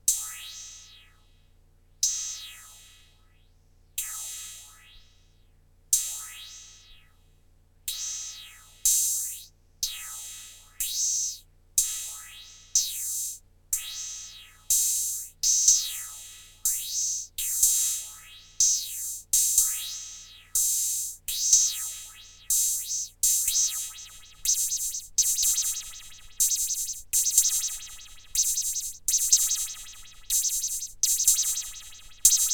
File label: phase shifter cymbals